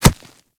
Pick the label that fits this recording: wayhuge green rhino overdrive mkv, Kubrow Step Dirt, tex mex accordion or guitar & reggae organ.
Kubrow Step Dirt